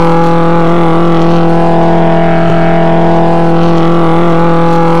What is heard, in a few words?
on mid ex, Engine; rTec Engine